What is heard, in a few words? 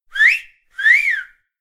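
wolf whistle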